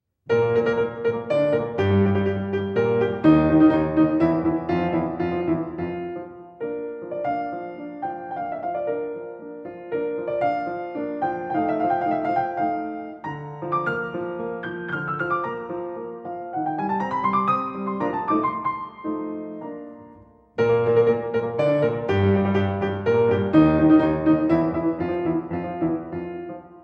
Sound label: Polonaise in B flat major; B.3